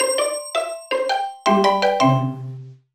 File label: Egg Found; moshi monsters moshlings theme park